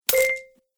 Loot Coin, video game

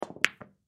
Pool Shot Bank, Pool Shot Bank Ball; Sports

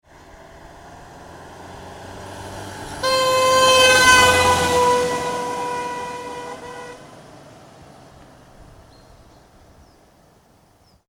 Car Past Fast with Horn Blasting; Car Sound Effects

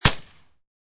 Suppressed Pistol Amphibian Field; Suppressed Pistol Amphibian Field Fire; Pistols; Guns; VRSonic

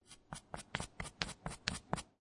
Billiard Pool Snooker Apply Chalk To Tip Of Cue; Sports; Lynne Music

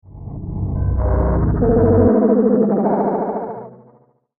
Twisted Alien Craft; Alien Sound Effects; science fiction sound effect

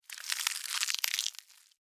Insect, Eat, Chew, Bite, Bug; Rip, Tear, Flesh; Insects Eating; Blastwave; Animals:Insects

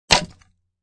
Break Wood, Breaking a piece of wood; Objects

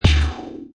Hi Tech Panel Servo Button

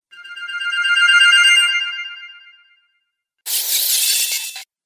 Magic Spells; Magic Sound Effects

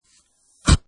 Cartoon Balloon Short Fart With Warning; Comedy/Cartoon, Balloon: Short Fart With Warning; Funny Sounds

